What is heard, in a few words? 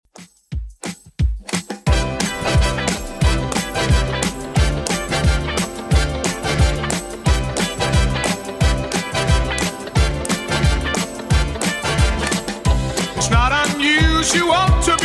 60s Redrum 178bpm